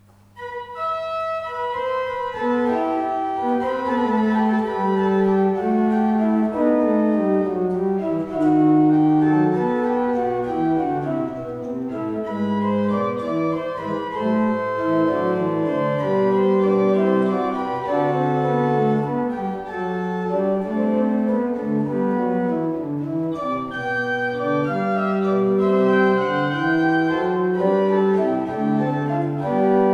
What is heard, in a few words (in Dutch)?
Suite E mineur, orgels; annerveenschekanaal; Handel